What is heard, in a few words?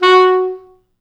F#3, Pop Brass; Best Service ProSamples vol.19; AKAI